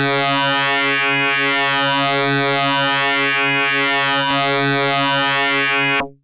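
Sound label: Electric Bass DX100 C#1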